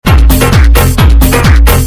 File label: Loop